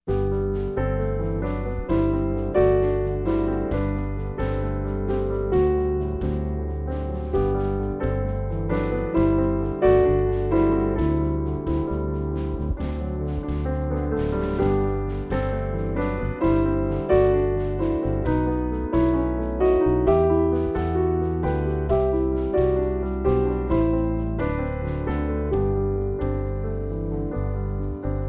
With Trio

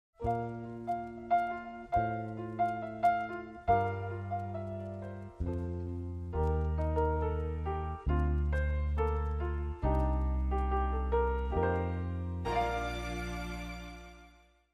No Drums & Guitar

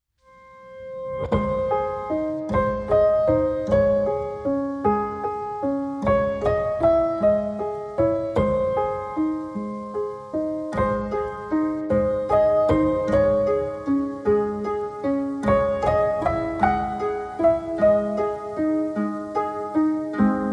Pianos and Harp Mix

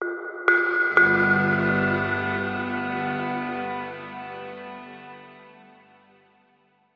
Link Sting

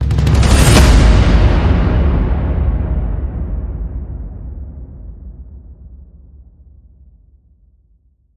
Thunder Rolling Hit Machine

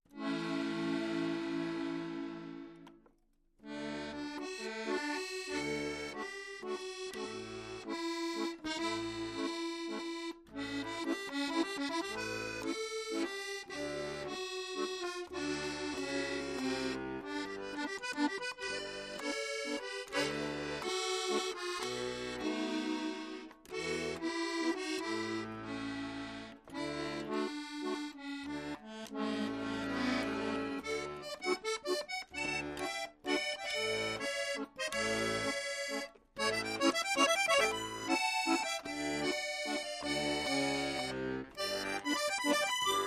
Tipsy Accordion

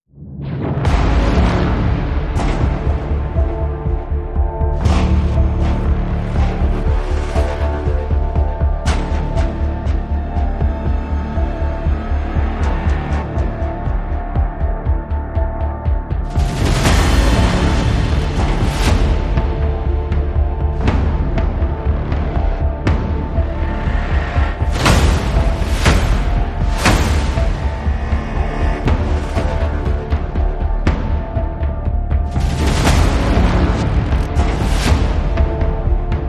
No Strings

